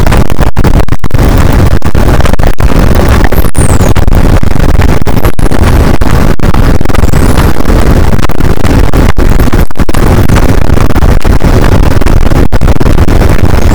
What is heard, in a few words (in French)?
cachalot positif, boat; Physeter Macrocephalus